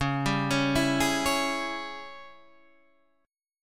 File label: G sharp Major 7th Suspended 4th Sharp 5th, C sharp